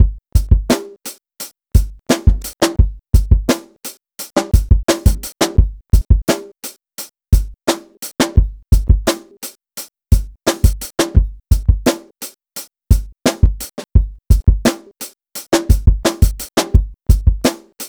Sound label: Drum Kit, Electro Lounge; Sonic Foundry (Sony Creative Software); 086 Sister Strut